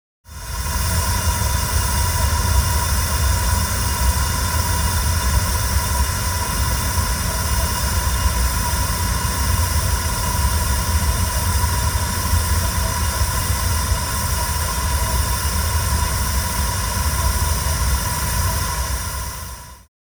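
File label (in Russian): gas hissing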